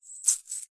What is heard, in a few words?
industrial chain small catch hand